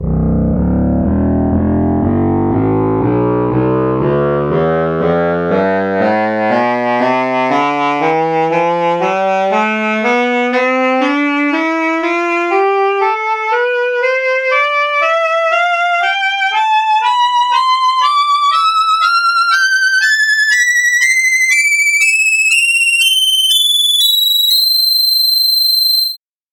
alto sax tonleiter

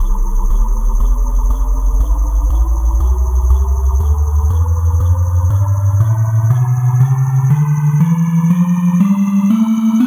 bottle chiff tonleiter

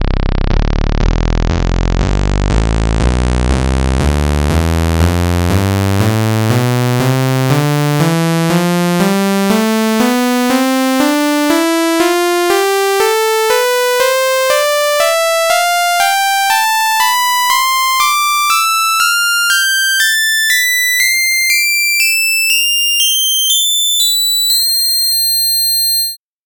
bass lead tonleiter

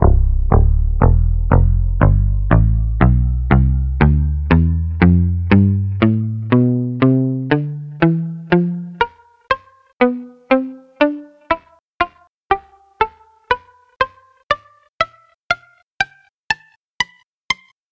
palm muted guitar tonleiter

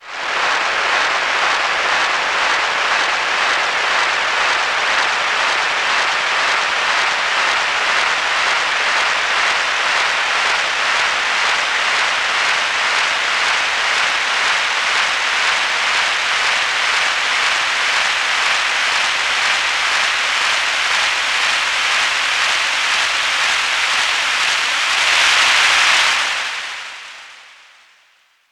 applause tonleiter

